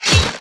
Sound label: unlucky rush